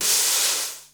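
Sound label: snare brush swirl, Yamaha Motif ES drum machine; 300 Drum Machines; Yamaha Motif ES-Series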